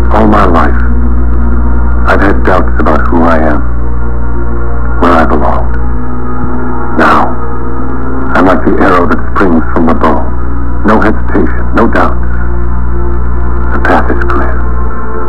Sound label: arrow